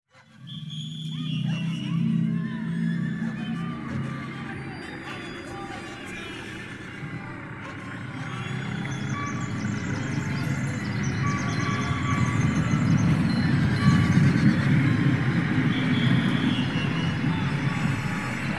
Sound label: Big City